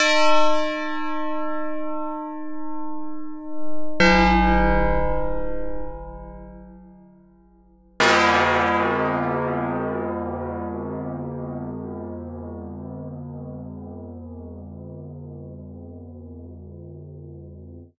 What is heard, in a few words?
bells